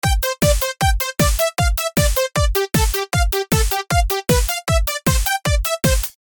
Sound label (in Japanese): ノコギリメロノイズ無し